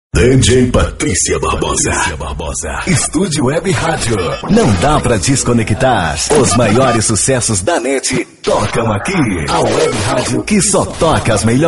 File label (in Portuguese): Vinhetas para dj e radio